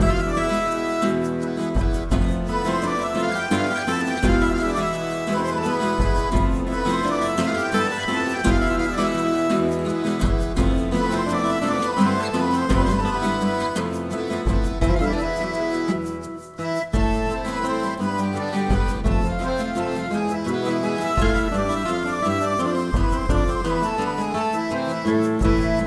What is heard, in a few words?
Town Tavern